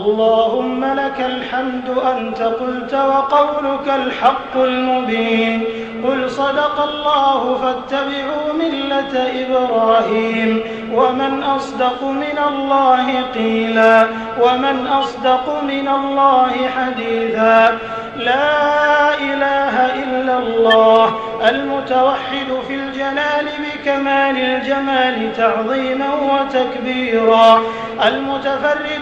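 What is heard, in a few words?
Islamic Dua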